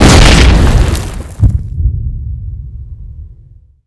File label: grenade explo